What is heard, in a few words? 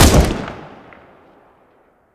scar20, weapons; Counter Strike Model Pack; CS2